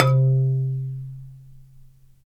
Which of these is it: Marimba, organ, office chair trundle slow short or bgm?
Marimba